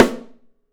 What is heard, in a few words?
Drums, MARCHING; 3D Drums; OP MARCHING